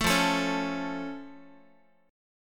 F Augmented Guitar, chord; F+ strummed; 12,13,12,11,x,x; 4A,4E,5A,5D,5G#,6C#; chord shape

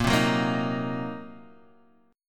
C Minor 7th Flat 5th A sharp, chord

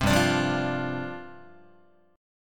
G 7th Suspended 4th G down Guitar

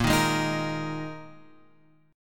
A sharp 7th Suspended 4th A sharp, Guitar, Open F; A#7sus4; strummed; x,5,3,3,6,5

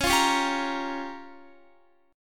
Db 6th Flat 5th, Guitar; strummed; JGuitar

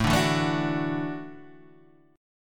E 9th Suspended 4th A, guitar

